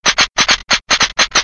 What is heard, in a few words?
Defective Turret sabotage factory defect dryfire